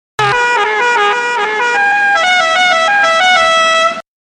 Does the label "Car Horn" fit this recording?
Yes